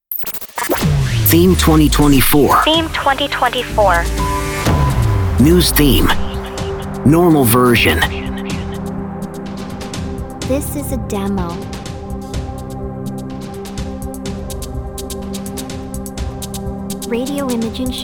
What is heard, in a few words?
Theme News Weather Traffic